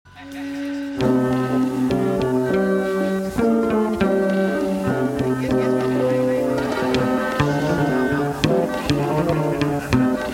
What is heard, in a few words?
Maritime Jazz